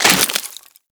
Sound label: Melee Impact Wood